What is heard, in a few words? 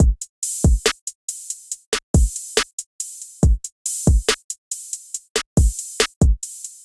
MPE Kit, Trap Drums; MPE Jaded Kit; Trap Drums by Sound Oracle; Ableton; Factory Packs